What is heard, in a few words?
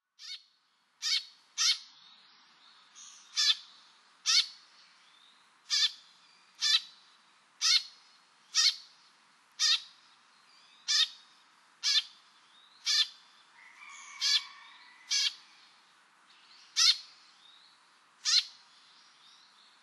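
Eurasian tree sparrow, utsunomiya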